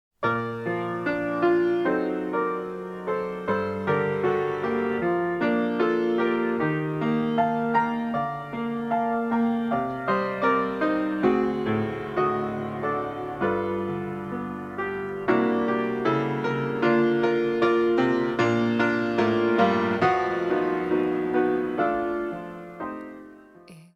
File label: So classical